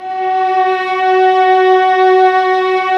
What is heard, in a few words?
STR VAS, Amb p; Roland L-CD702; Vlas Sect3-4